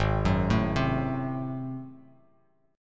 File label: G sharp 7th Flat 9th G sharp down Bass Standard, chord; G#7b9; arpeggiated; JGuitar